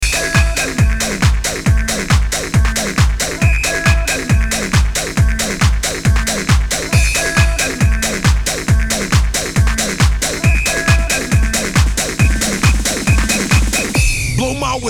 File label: the whistle song